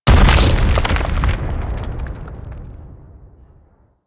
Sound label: Spawn Drop Impact; Warframe; Corpus; Arachnoid Wraith